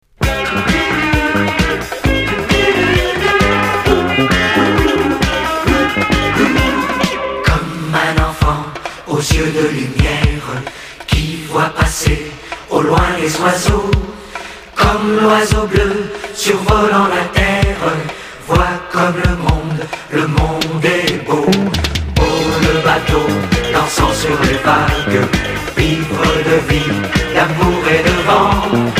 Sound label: disco